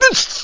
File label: piglette damage; Angry Birds Space